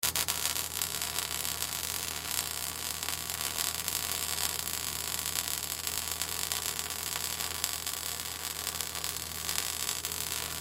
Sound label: Spot Welder Spark, Spot Welder Spark Zap Arching; Industry; Blastwave